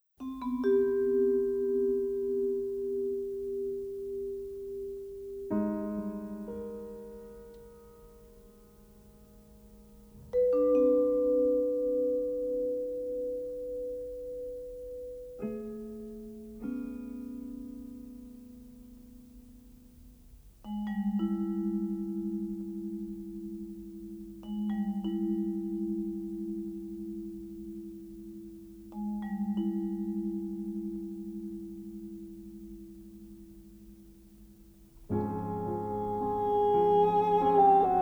Jazz Suite for Bassoon Ballade

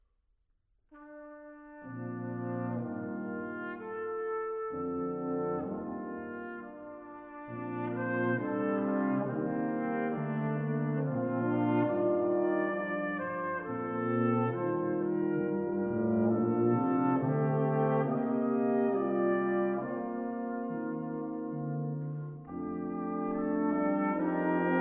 Brass Quintet, Quarter Note=72; Art Collection music of Arthur Frackenp

